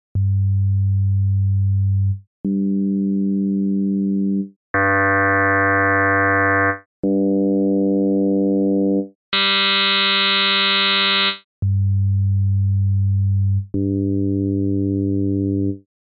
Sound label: FM Test